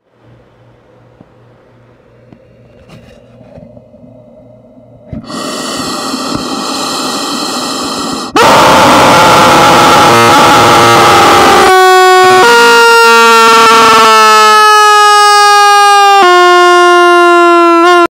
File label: rubber chicken scream